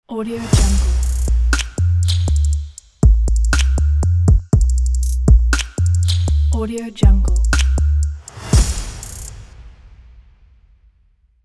beat logo

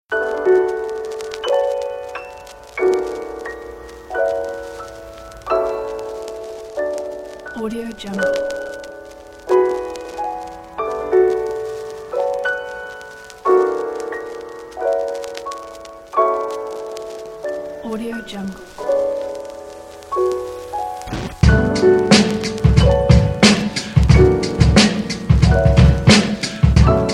lofi piano

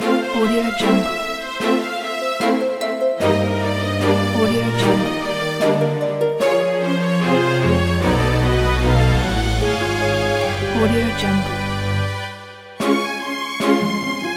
magic ident

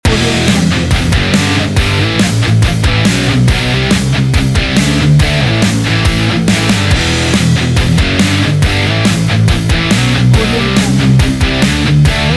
heavy metal loop

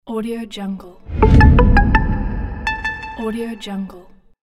tv logo

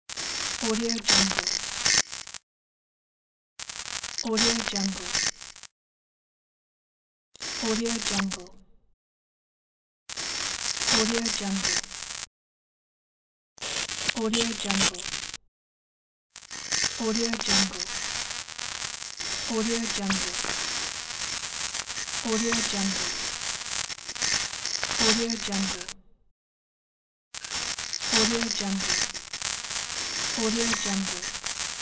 the glitches

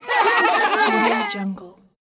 a cartoon group laugh

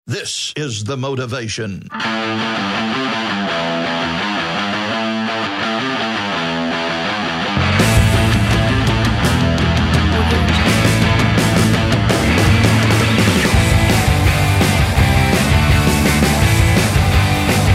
garage jam

